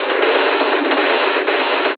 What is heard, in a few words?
Mole Bumpers, Fx; Sonic Foundry (Sony Creative Software); Esoterik Beatz